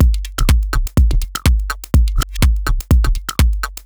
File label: 124bpm, House Drumloops; 90 s Sample CDs; Zero-G House Fabrik Drumloops; ZG Drumloops